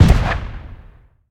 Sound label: enemy Fire; rage of the seas